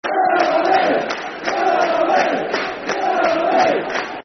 Red 'n' White Army Football Chant; Nottingham Forest Nffc

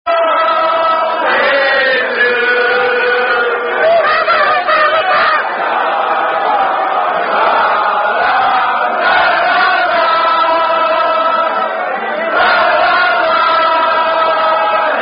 Sing-A-Long; Bingham Cup 2008

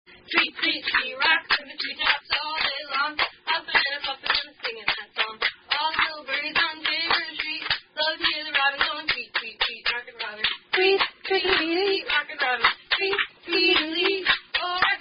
Clapping Game, Variant Gestures 3 Person

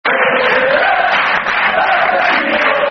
Football Chant (Soccer Songs); Papa Diop; Fulham Ffc

